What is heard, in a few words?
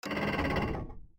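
door buzz effect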